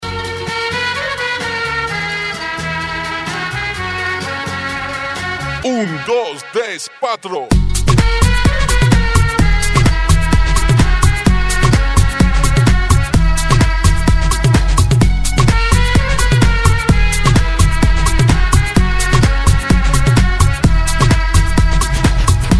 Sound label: Melody, RingTune